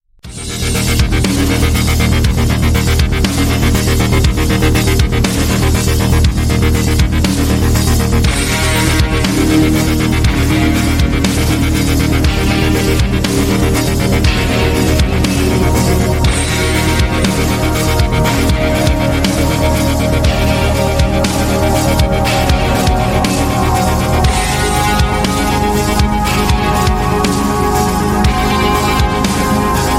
Doom metal

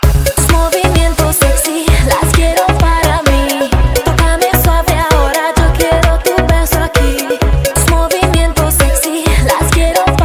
Club; Bomb

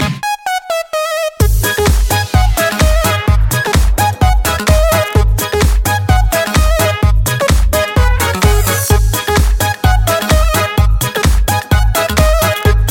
House Mix

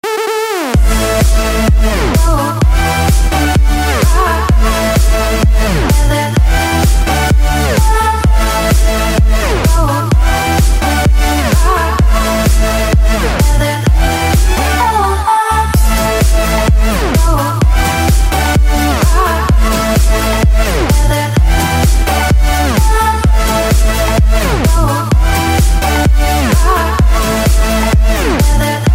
dance Hit

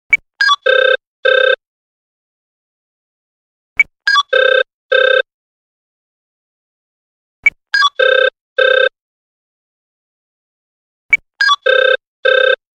Bright Ring Ring

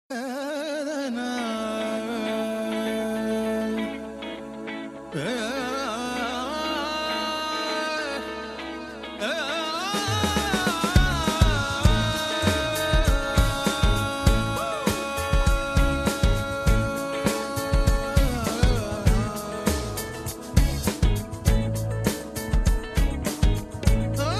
Classical

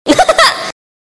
Sms Laugh Fast

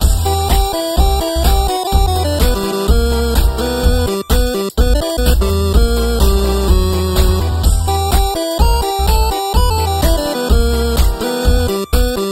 8bit

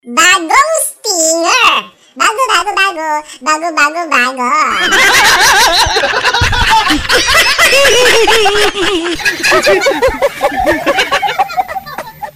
Stinger; Bagong

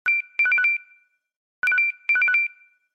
Beep

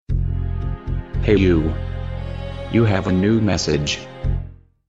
New Msg Tone

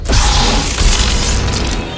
Light Saber

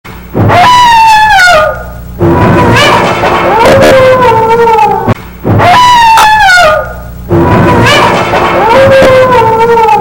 Mexican Coyote

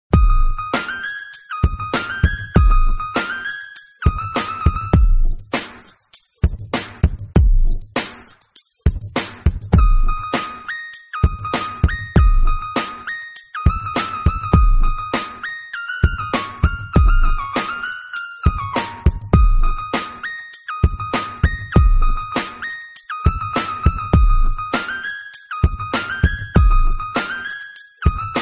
Drums; Airtel

